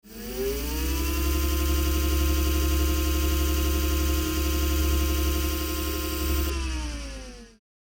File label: Electric scooter close perspective